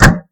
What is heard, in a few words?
door closed